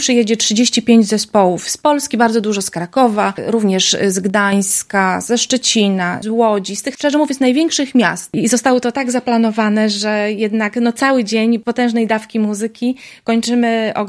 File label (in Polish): fortepian